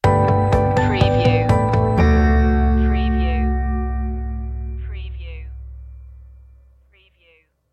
starting something new stinger

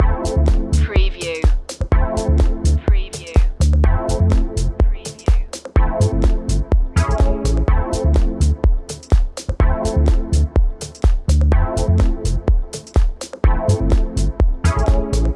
groove loop

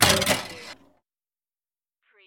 Pinball sound, big spring with ball roll

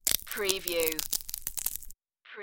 tiny crunchy bite

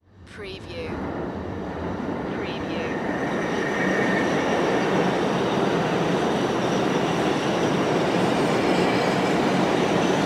Subway Pass By

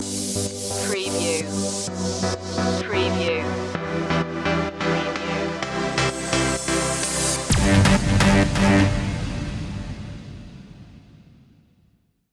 trance stinger

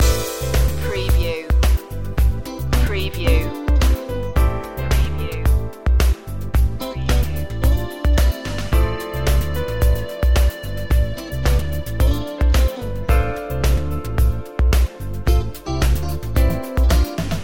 smooth chill loop

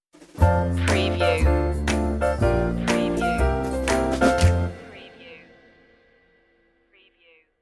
fresh and fun stinger

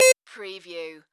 Beep Modulated Single; sound-effects-bible

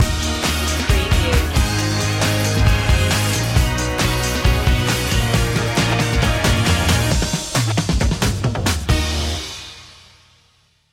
the funky stuff stinger

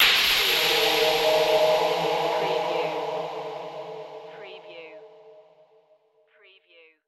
Hit Vox

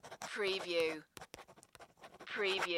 Pen Write On Cardboard

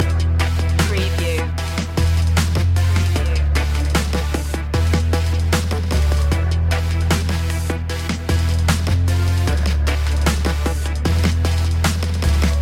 urban jungle loop

